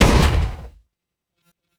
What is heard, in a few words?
cannon fire; arrgh-hoops